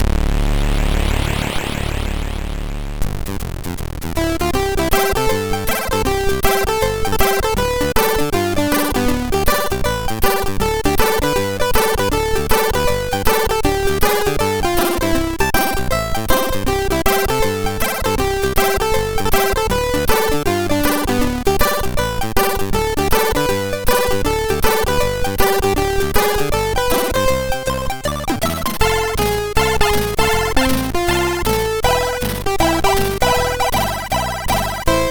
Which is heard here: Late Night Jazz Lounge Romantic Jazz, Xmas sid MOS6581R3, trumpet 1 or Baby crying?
Xmas sid MOS6581R3